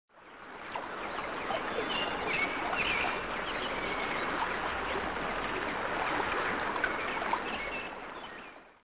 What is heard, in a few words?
brook Babble; Solitaire